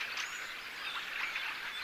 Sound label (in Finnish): mika rastaslaji